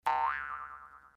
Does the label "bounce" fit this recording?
Yes